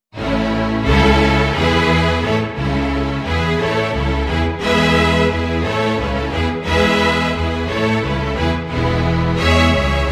string orchestra; Alla Hornpipe; string quartet

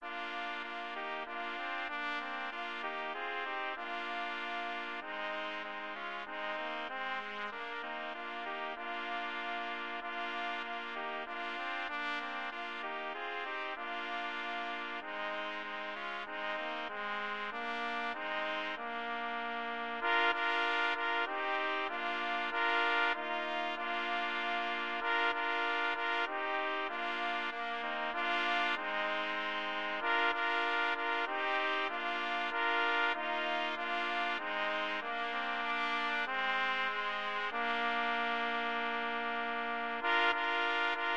trumpet, trumpet trio; promenade

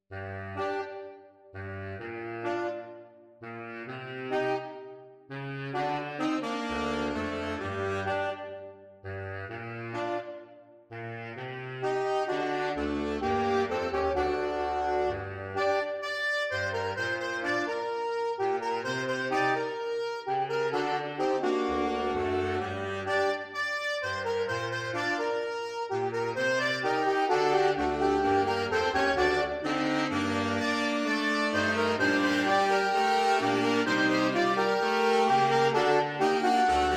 Saxophone Quartet; SATB or AATB

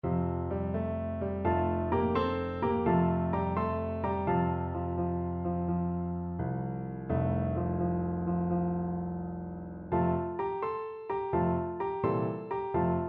Here We Come A Caroling intermediate duet; intermediate piano duet; Here We Come A Wassailing

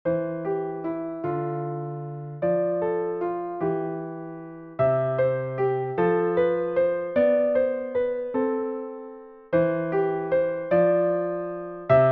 Solo, piano solos; Queen Guinevere; the round table

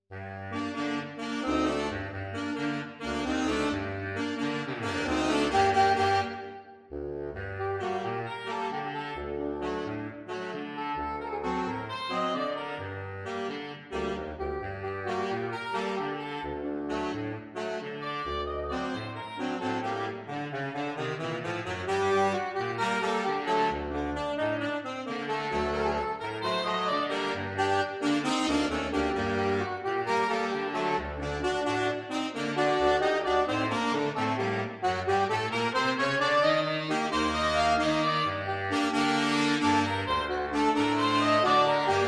Sax quartet; SATB or AATB saxophone quartet

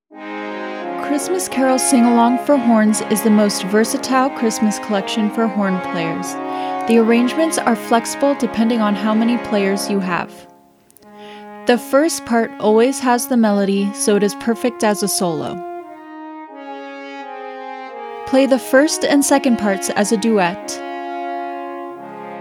Christmas Carol Sing Along for Horns, VOICE OVER

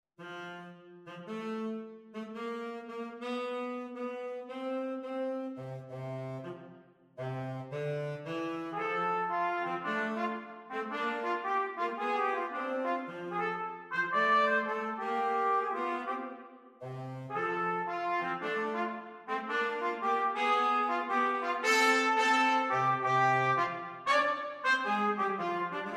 Jazz Duet For Trumpet And Tenor Saxophone

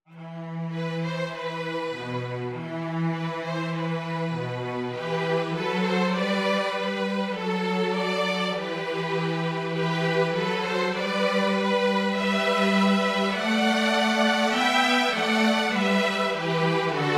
March string trio 2 violin cello; 2 violins and cello

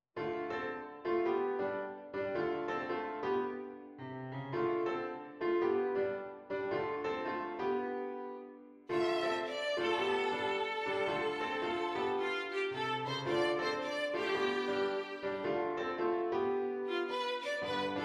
VIOLIN

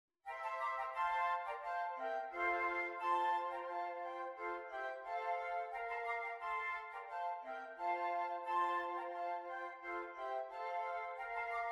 Flute; flute quartet

